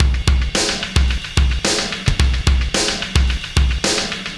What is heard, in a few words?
Oscillation Beat